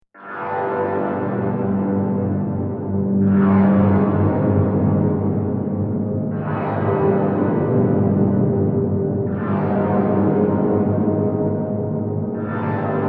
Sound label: Drone Musical Tension Scary Tonal Mysterious; Horror Musical Building Drama Tension; Scary Soundscapes